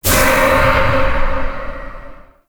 Survival Spirit Release; Warframe; Zariman

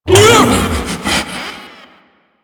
Ape Prelate Pain, Vocals; Enemies; Warframe; Proto Infested

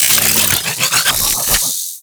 Sniper Rifle Reload; Warframe; Weapons; Corpus

